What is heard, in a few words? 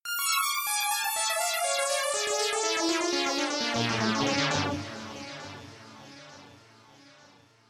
arpeggios echofx